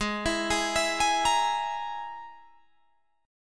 Ab Suspended 2nd Sharp 5th Ab, Guitar Drop D; 18,19,18,21,21,18; down

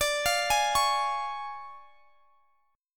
D Minor Major 7th Flat 5th D, guitar; DmM7b5; arpeggiated

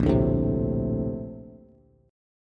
A sharp Major 9th, Bass; strummed

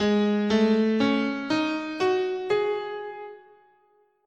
Ab Traditional Japanese "in sen", scale

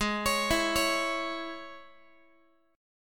G sharp Suspended 4th Sharp 5th G sharp, Guitar; arpeggiated

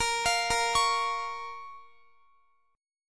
Bb Minor Bb down Guitar; Bbm arpeggiated

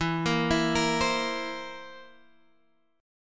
Bb Suspended 2nd Flat 5th

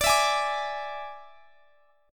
D Suspended 2nd Flat 5th; strummed; x,x,24,21,21,22

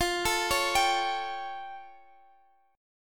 A 7th F; A7/F arpeggiated

